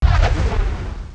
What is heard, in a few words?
wozz; BlockOut